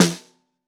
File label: Snare Bottom